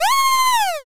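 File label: scream male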